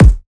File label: Kick; Heater; Cymatics; EDM Starter Pack; Drums - One Shots